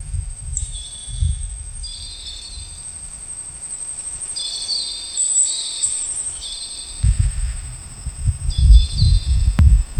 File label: a colorful spring village